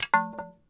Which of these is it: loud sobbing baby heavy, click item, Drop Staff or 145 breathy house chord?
Drop Staff